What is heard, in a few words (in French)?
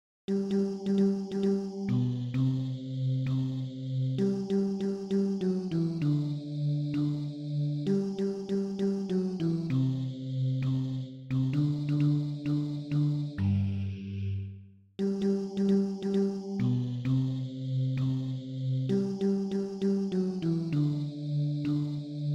Basse